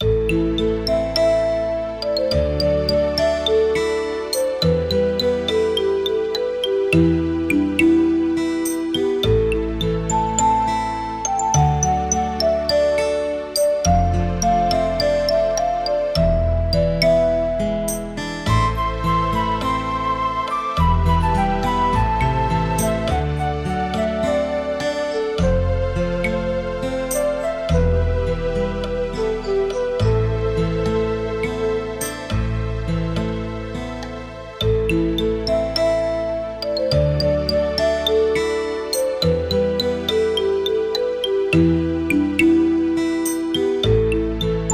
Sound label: bgm; Become Slime